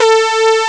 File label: synth brass